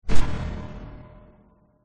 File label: sound design hit thud dark scary